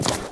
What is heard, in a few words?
branch step; Golden Crayon Sounds